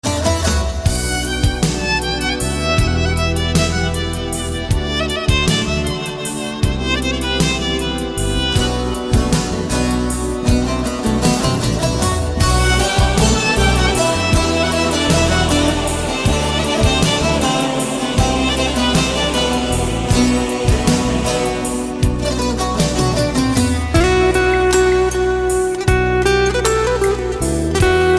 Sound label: music turky